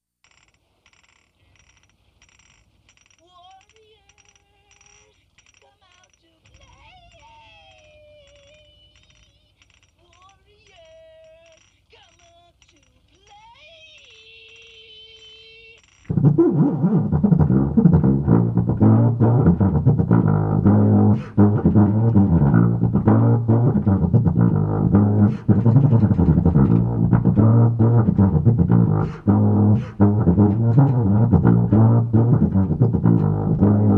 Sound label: Brass Band